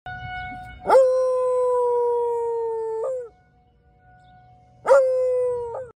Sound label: Estonian Hound; تازی استونیایی